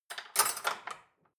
dishwasher cutlery grab

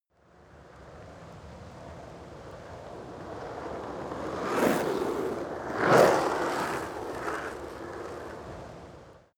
skateboard double drive by

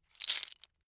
bone small handling left

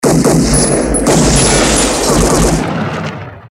shot seq

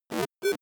broken computer random fraction

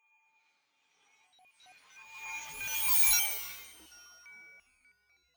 fly by drone digital long

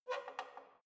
letterbox metal pull open soft

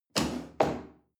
chair wood place slide medium

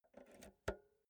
insert jack multi socket slow

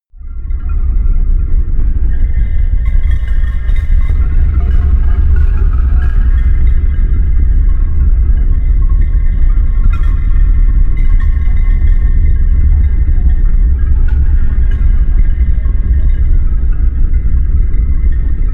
bell rumble

